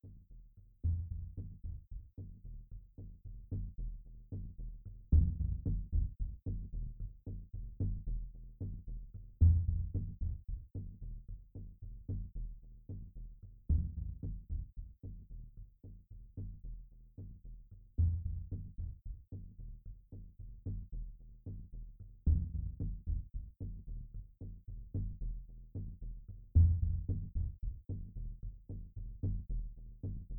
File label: Doom Underscore